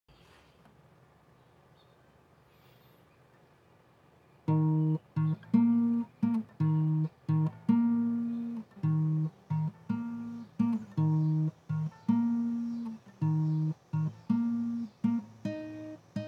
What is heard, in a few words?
Guitar Attempt